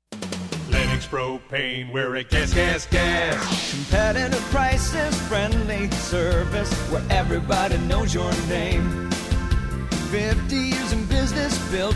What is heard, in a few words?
Jingle